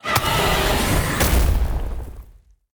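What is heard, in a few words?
Earth Servant attack, monster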